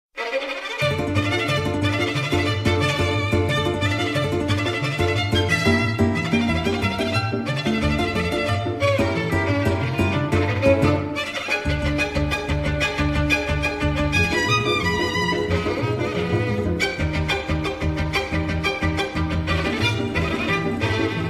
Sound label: Violin